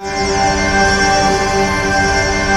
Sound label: PHANTOM; NorthStar - The Wizard VOL-2; IMPULSE